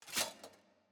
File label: ambience room armor training